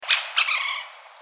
american three toed woodpecker

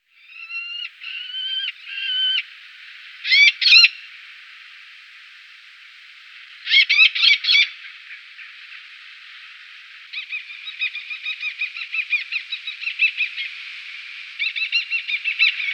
eleonoras falcon

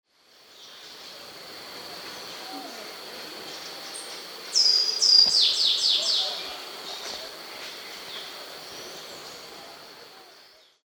white eared ground sparrow

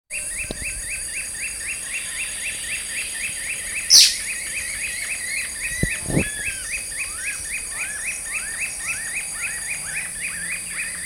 green and rufous kingfisher

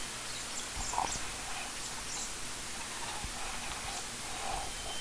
antillean crested hummingbird

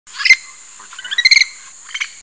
red lored parrot

